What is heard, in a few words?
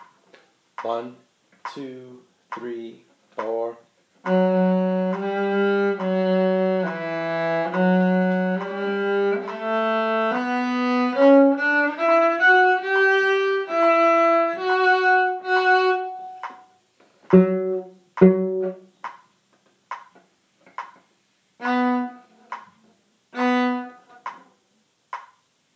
night shift violin